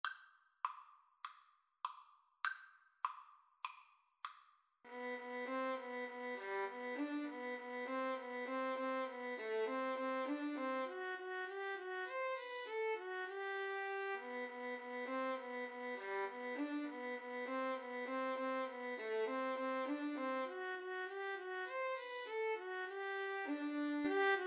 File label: jingle bells violin duet